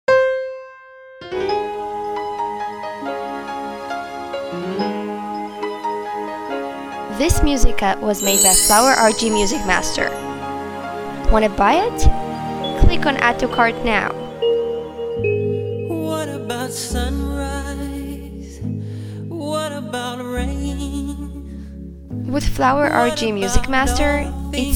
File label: music rhythmic gymnastics